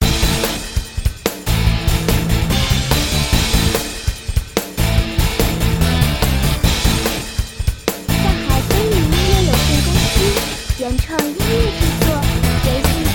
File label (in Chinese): 摇滚热血战斗; battle